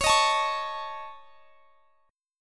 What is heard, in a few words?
Db Suspended 2nd Sharp 5th Db, chord; strummed; x,x,23,20,22,21